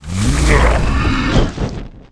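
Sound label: attack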